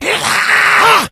rage at victim, infected, male; Left 4 Dead 2; Source Engine

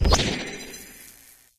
portal exit

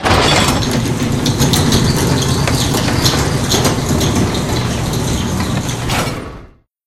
grate open

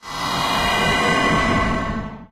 teleporter send; tf

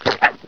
scientist die; hl1; Source engine

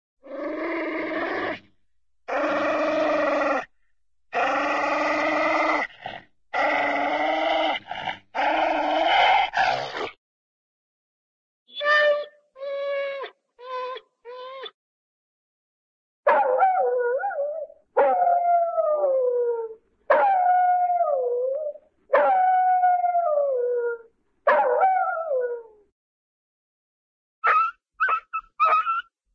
dog spaniel angry growls, animal; cartoon

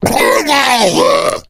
female boomer shoved; player; voice; source engine; left 4 dead 2

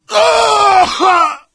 crit death; video games; source engine; tf

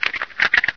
ag idle; agrunt; video games; source engine; bshift

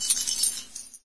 m249 chain, weapons; Source Engine; cstrike

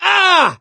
hurt major, gambler, survivor voice; source engine; left 4 dead 2

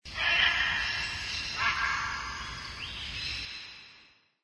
animal call bird; Source Engine; tf; lair

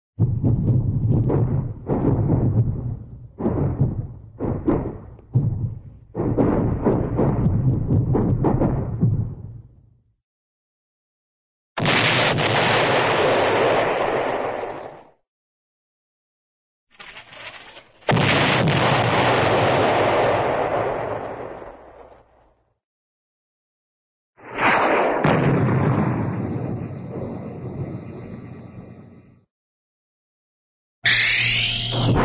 explosion distant artillery explosions; cartoon

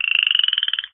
frog; ambient; animal; Source Engine; cstrike

